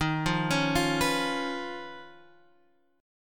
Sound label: Eb 7th Suspended 2nd Sharp 5th Eb, Guitar Open G; 13,10,11,10,12,x